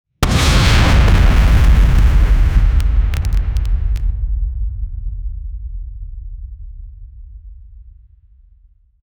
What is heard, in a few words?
cinematic logo reveal, elemental